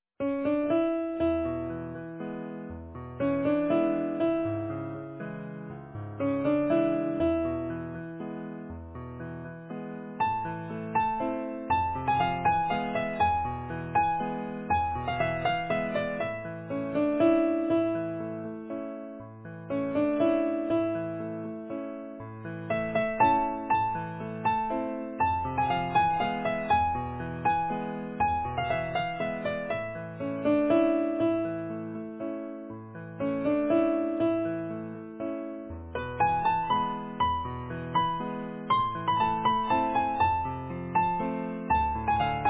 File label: Easy Piano